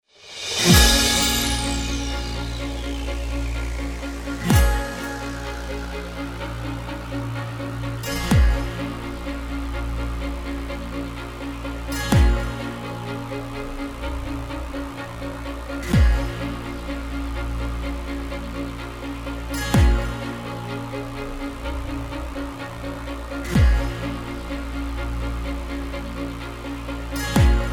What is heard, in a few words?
News Alt Bed Only; BBC Tees; News 7